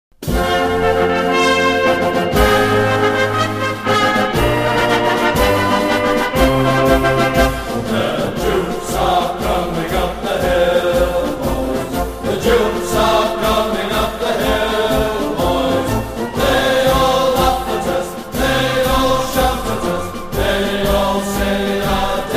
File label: Regimental Band Rugby Song Regimental Song; Duke of Wellingtons